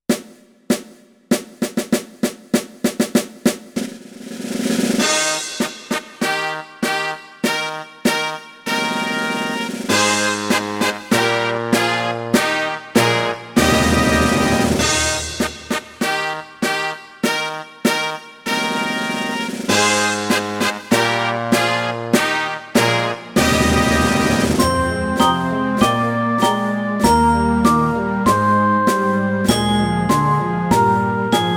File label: Fanfare Opener Eb, brass backing